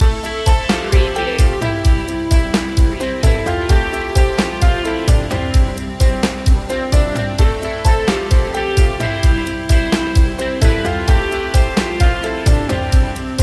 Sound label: cheerful inspiration loop